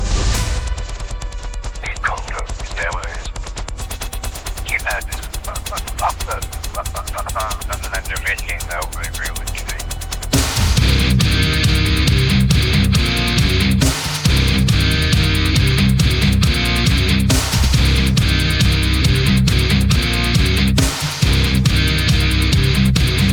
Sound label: background music